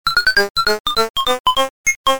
Round Clear Music; arcade

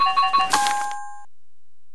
Hammer; FIFjr

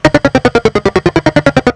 End Fall

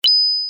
Kiss; FIFjr

